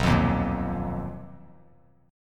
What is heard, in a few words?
B Major 7th Flat 5th B Bass Standard; BM7b5; strummed; JGuitar